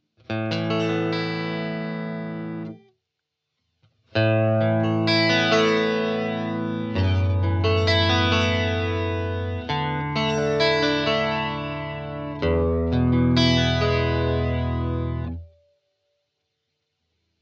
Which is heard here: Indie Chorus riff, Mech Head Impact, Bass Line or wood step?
Indie Chorus riff